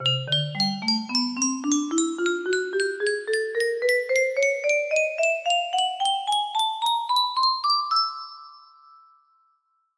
music box melody

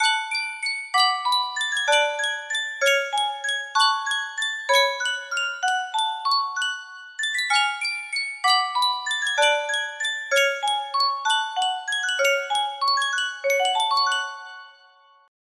Sankyo Music Box

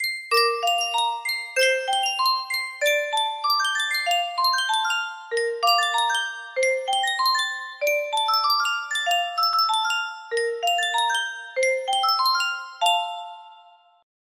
Sankyo music box, music box melody

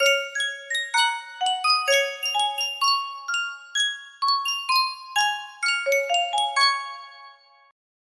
Sankyo Miniature Music Box, music box melody

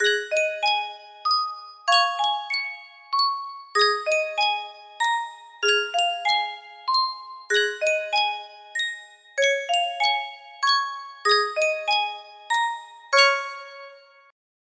Yunsheng music box, music box melody

